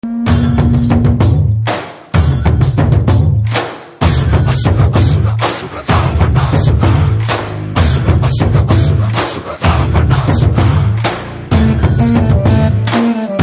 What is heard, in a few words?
BGM Telugu Ringtone; Asura